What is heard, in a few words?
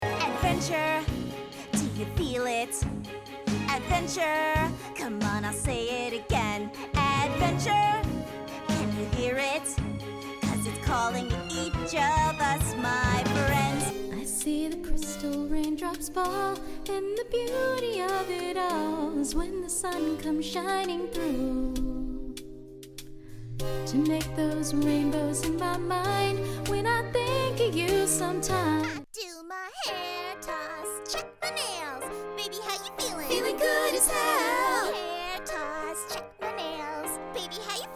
Sing